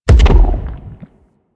Stomach Grenade Explosion; Warframe; Warframes; Grendel

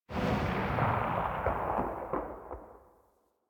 Heavy Grenade Launcher Tail; Warframe; Archwing

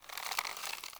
Squid Plant Snore; Objects; Traps

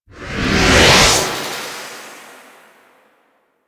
Tengu jump; Warframe

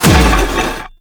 Shield Scrape; Warframe; Weapons; Melee; Whooshes

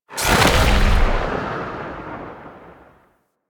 Lightning Spawn; Enemies; Sentient; Sentient Movement

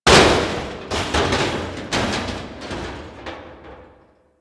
Destruction Metal Falling; Ambience; Warframe; Corpus Railjack

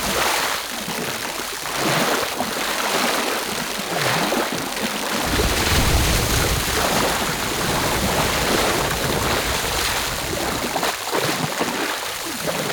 Waterfall Medium Splash Loop

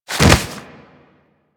Roll; Warframe; Generic Foley

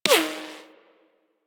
Bullet Impact Ricochet; Warframe; Weapons

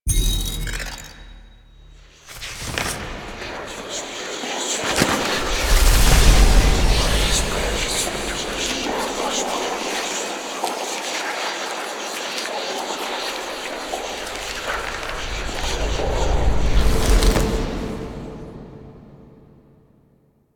Grimoire Pickup Full; Entrati Labs

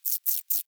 Forest Rodent Vocal Idle; Characters; Warframe

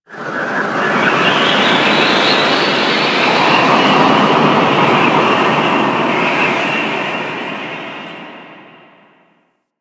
Space Wind, Ambience; Warframe; Orokin Moon

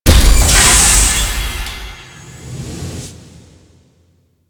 Forest Storage Drum Start; Ambience; Props; Warframe; Grineer Forest

